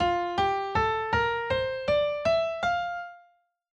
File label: Major Scale F